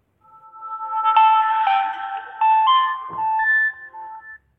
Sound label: Samsung Power On; Samsung Star